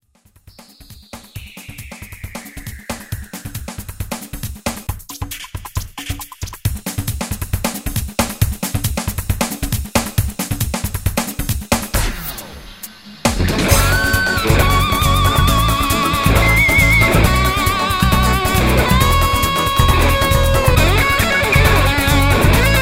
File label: guitar